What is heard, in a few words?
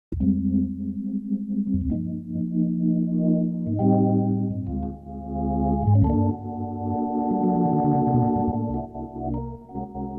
cx3 demo, korg